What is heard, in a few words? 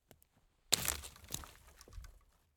A Lot Of Branches Are Breaking Close Up